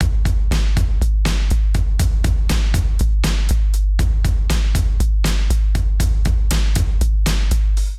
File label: Kit groove 130bpm, drum loops; Neon Midnight; Ableton; Singularities